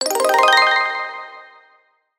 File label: win; wbg games stable; risk coin